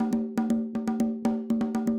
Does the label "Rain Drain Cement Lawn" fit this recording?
No